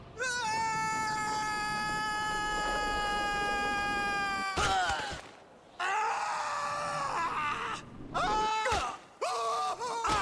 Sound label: falling scream; gta